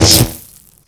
lightning gun zap; tf2

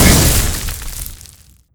acid ball burst; tf2

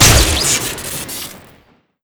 neon beam fire crit; tf2

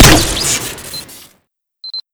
grenade jumper shoot crit; tf2

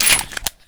draw pistol knife; tf2

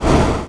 welder fire; tf2

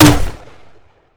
brick launcher shoot; tf2

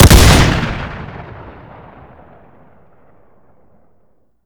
shoot, weapons; tf2; ironside

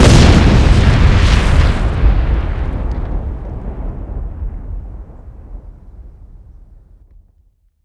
dynamite bundle explode; tf2

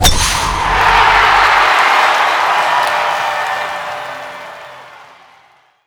golf club hit special; tf2

